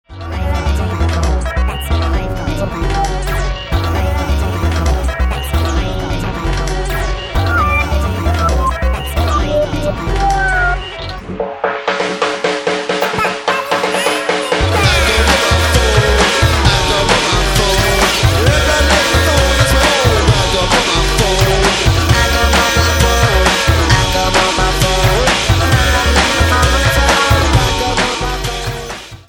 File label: phone